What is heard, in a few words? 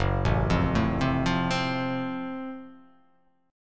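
Gb Minor Major 7th Flat 5th Gb, 8 string; arpeggiated; 2,0,3,2,0,0,2,x; JGuitar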